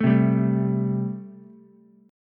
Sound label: A-Minor 7th A Ukulele; 2,0,3,0; Am7 strummed; JGuitar